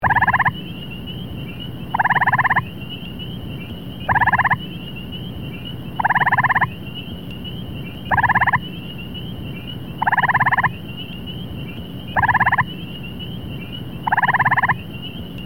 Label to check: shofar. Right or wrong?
Wrong